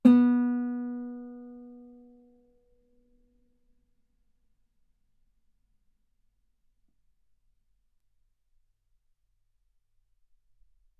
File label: Guitar ff sul B B3